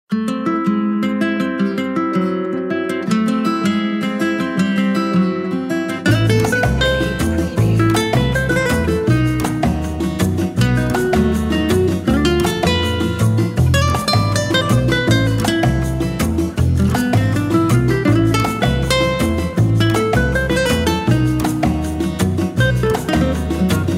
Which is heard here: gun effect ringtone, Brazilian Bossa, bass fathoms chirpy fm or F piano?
Brazilian Bossa